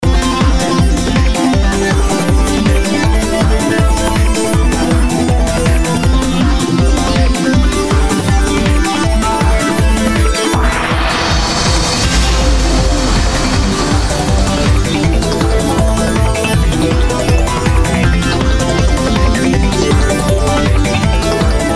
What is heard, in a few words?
eurobeat style